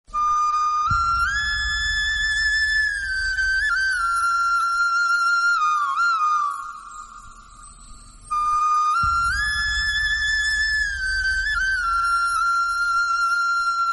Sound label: instrument